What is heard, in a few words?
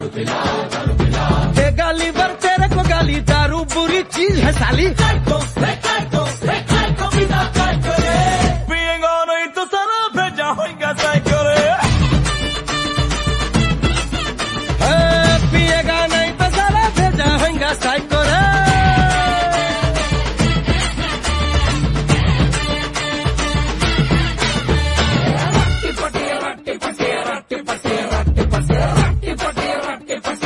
Hindi Song Ringtone